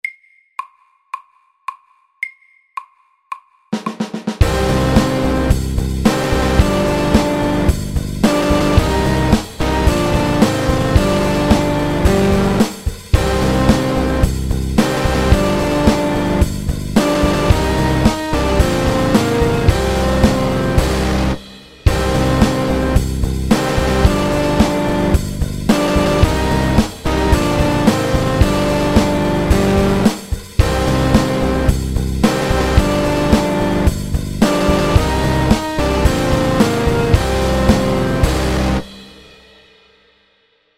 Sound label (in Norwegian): Triplet metal MIDDELS